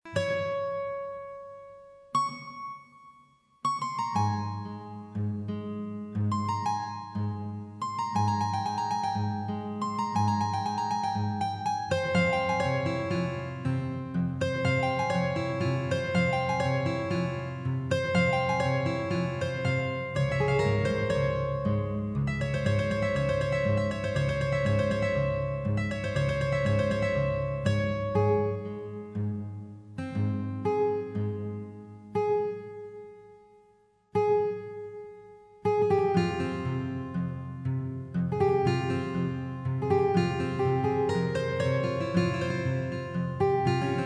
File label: Guitar